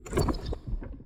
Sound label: room deactivation